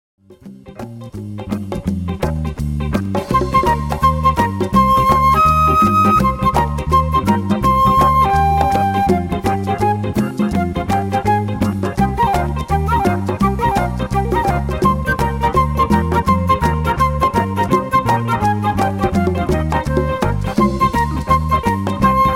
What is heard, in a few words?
Ska Beat